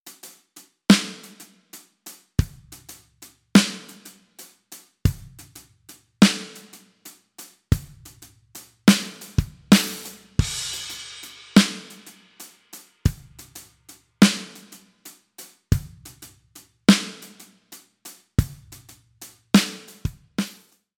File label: No Ambient Busses; BFD Player